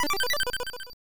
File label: Coin Sound; Ganbare Goemon Gaiden 2: Tenka no Zaiho; Family Computer; Gradius Coin Sound